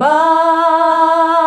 "BAH" E, FEMALES; Best Service; Hyper Dance

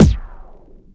B-SCRATCH; Akai MPC2500

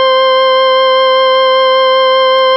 organ 5 C5, Fairlight CMI IIe, keyboards

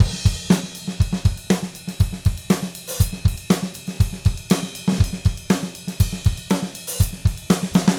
Gretsch groove 120 BPM, 1967 Gretsch Kit drums

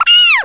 Stadium Organ; ESPN National Hockey Night

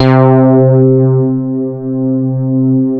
SH 1000, BASSES

